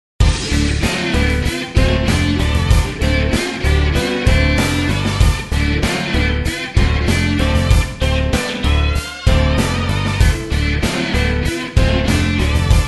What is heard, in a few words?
erhu rock